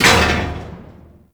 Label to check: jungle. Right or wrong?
Wrong